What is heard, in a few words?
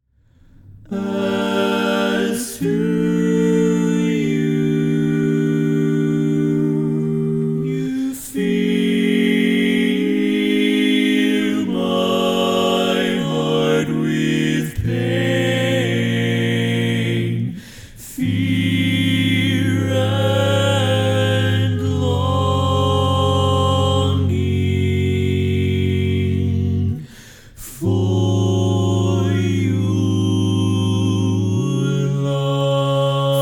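Baritone, barbershop tags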